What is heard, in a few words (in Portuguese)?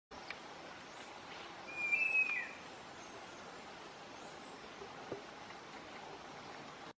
Canto da anambe escamoso